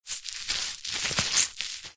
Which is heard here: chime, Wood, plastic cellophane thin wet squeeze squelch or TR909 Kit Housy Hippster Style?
plastic cellophane thin wet squeeze squelch